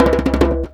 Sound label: Djembe Fill; percussion; musicradar